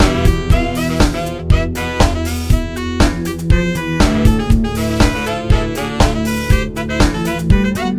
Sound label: sax